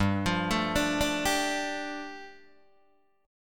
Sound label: G 7th Suspended 2nd G, Guitar Open D